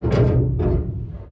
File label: damage vehicle; World Of Warships